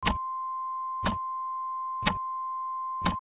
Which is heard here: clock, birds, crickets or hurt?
clock